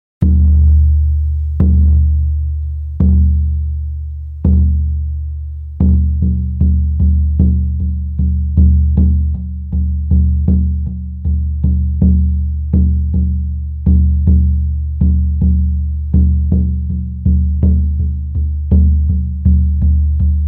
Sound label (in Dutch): koedrum 45 cm, sjamanendrums